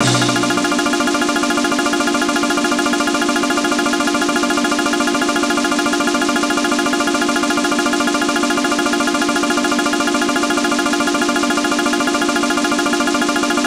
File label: Barbeque Shock; Banjo-Kazooie HD; N64